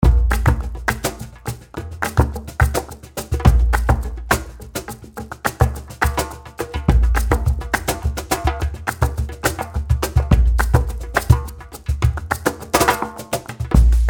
Flamenco Percussion

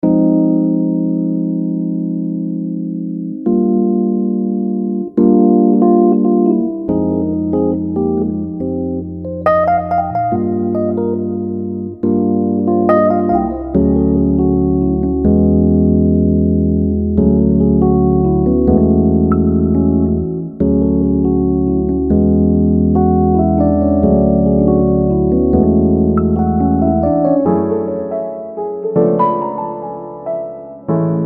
Jazzy Keys

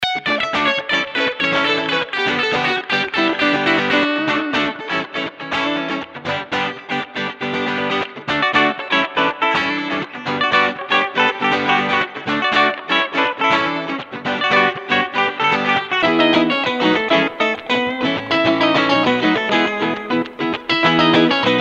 African Guitars

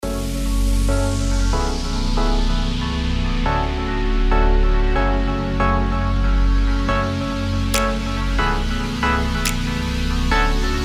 Organic Future Bass

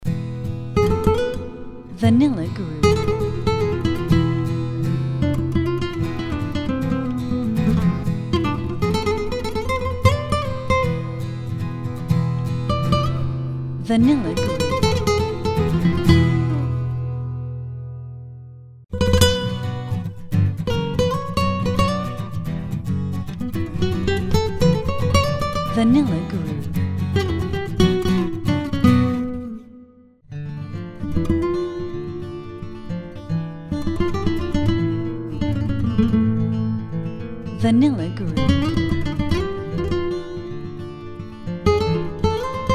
Latin Guitars

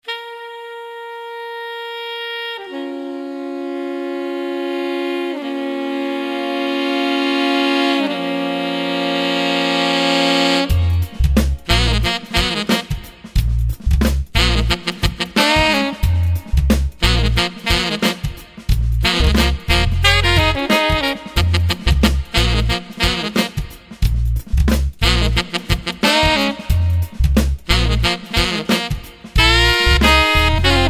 Sax Madness

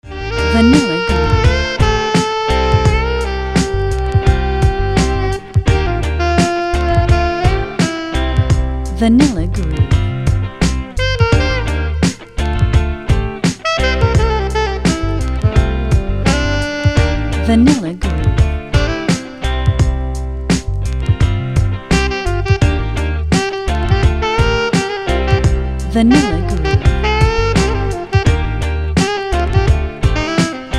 Smooth Sax Riffs

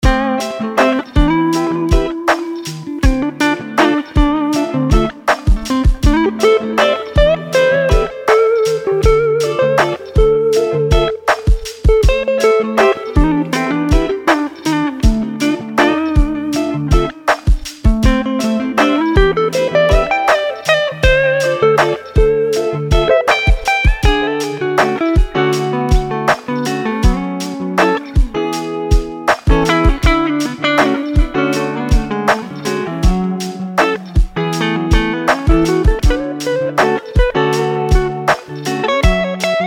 Smooth Electric Guitars

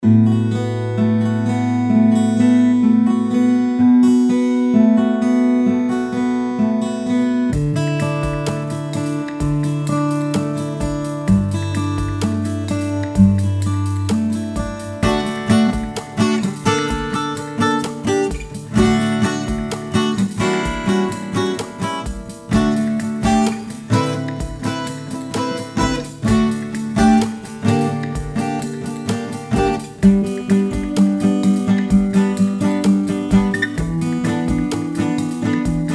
Pluck and Strum

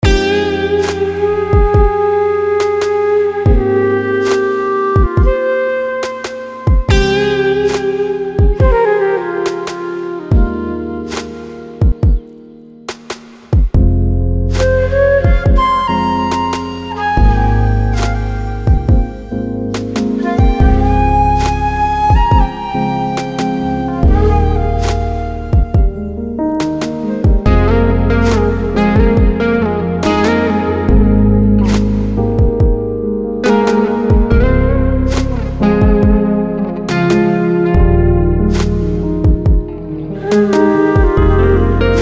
Ambient Tones

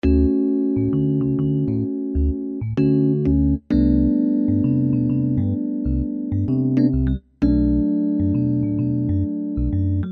Jazzy Keys

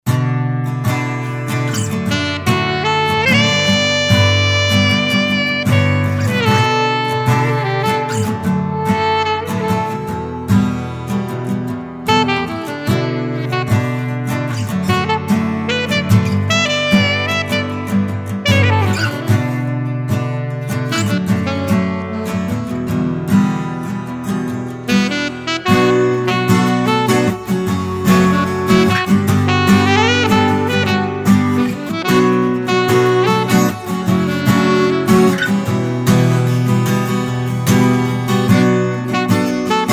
Smooth Sax and Guitar

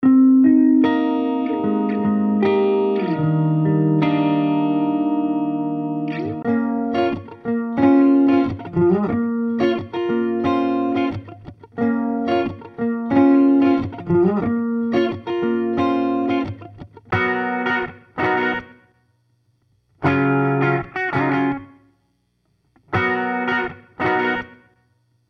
Easy Guitars

